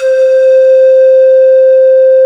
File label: PAN FLUTE C4; PANEFLUTE